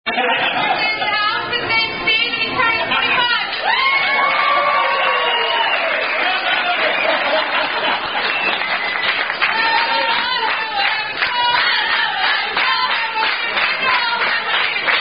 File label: Birthday Song, Happy Birthday (Tune Sound Off (The Duckworth Chant)